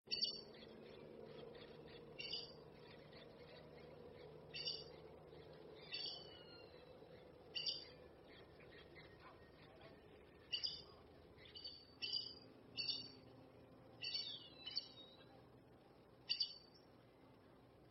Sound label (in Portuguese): Aracari de pescoco vermelho